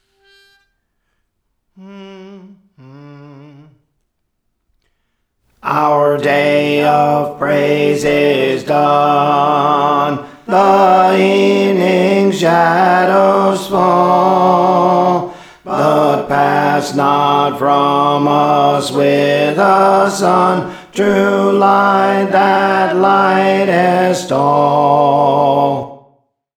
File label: Alto, Songs; Our Day of Praise Is Done; Praise For The Lord Training